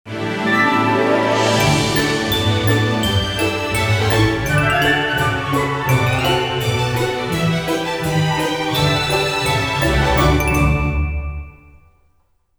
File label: BGM Cute